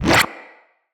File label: Magnetize Deflect; Warframes